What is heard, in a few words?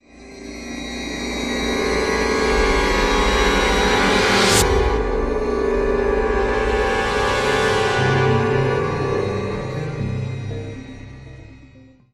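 sting, strings; transformers war for cybertron gamerip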